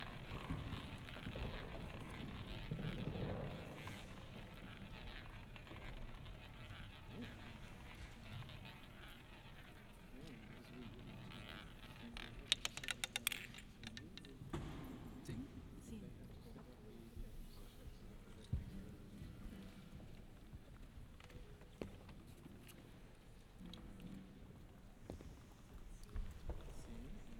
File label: Roulette; Lexikon-Roman